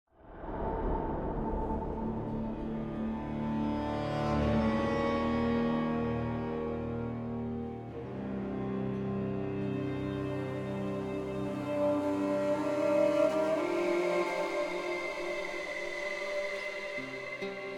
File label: music in queue loop